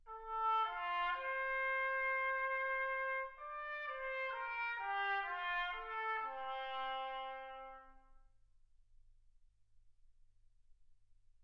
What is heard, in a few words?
trumpet